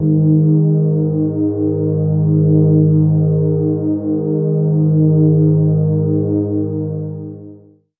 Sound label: pad d sharp3